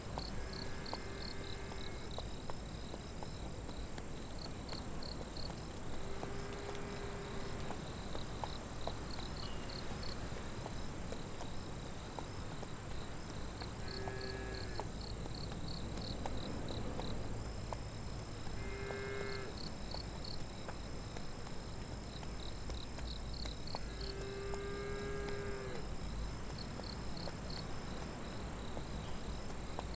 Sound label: outdoor ranch night insects